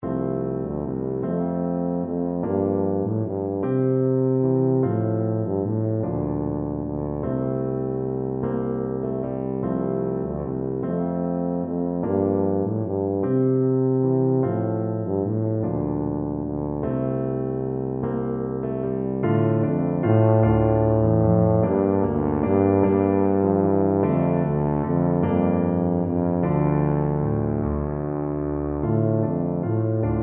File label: skyeboat, tuba